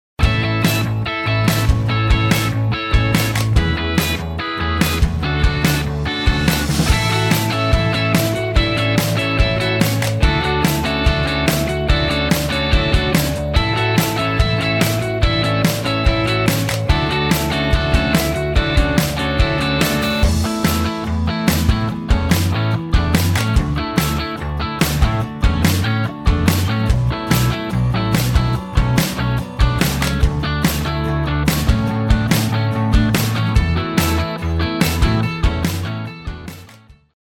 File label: Feel Good Pop